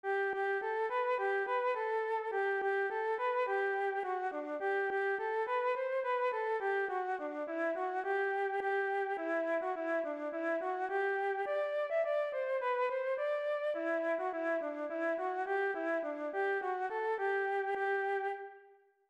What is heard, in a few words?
Yankee Doodle Key of G, flute solos